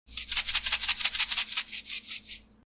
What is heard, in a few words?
salt shaking; ragemap2023